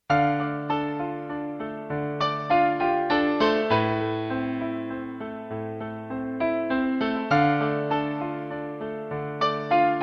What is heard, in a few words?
piano